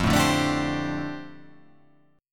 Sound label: F 6th Add 9th F down, chord